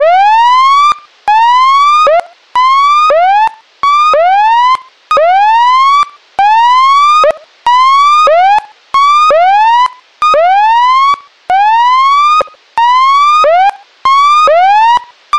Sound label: warning electronic siren